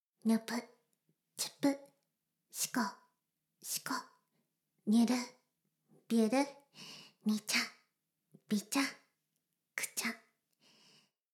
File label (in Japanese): シコシコ音声, ASMR; 魔法少女マスカリマッスル